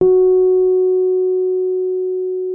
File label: Rhodes MK1 F#4; Xsample VOL-1